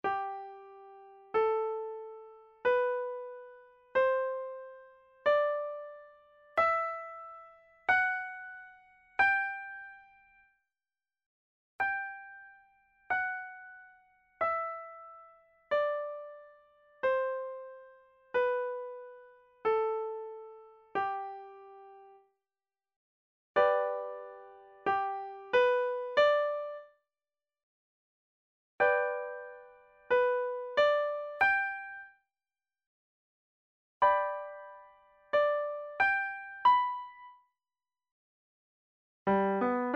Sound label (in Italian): Esercizio sulla scala di SOL maggiore